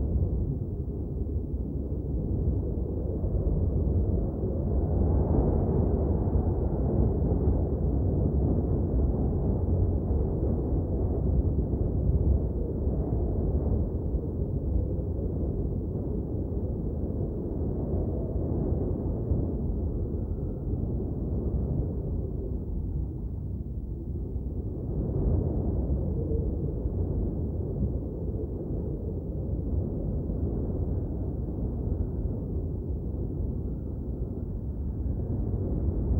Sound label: Environment, ambience